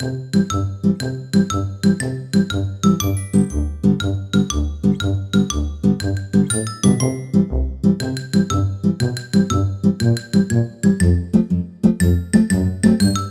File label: Intro Music; Peppa Pig Theme Park Fun; NDS